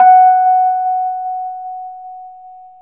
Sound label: RHSM F#, Rhodes; 3D Sound; 3D Keys VOL-1